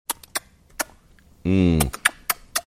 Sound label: making kissing sounds to